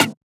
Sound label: Percussion